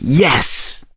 positive noise, gambler, survivor voice

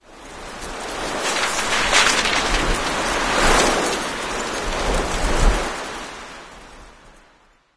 blowing papers

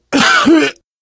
cough; source engine; left 4 dead 2

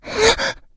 become alert, infected

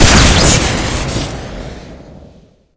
rocket shoot crit; tf

